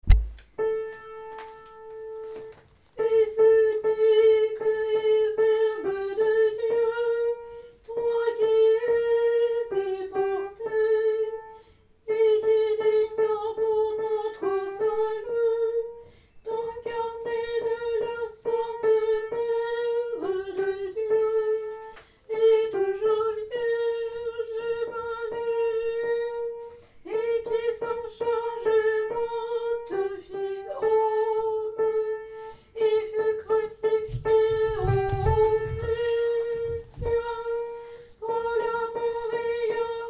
soprano

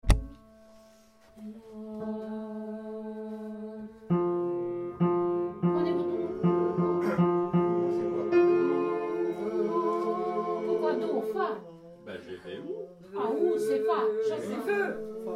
Venez adorons Tenor

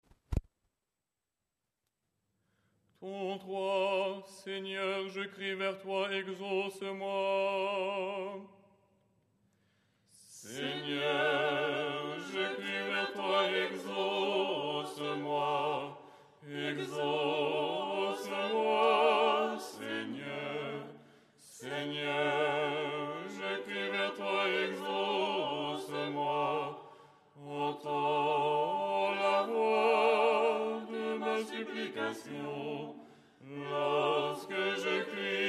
stichere lucernaire ensemble

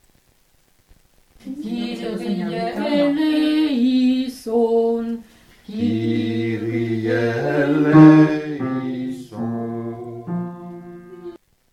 Kyrie basse

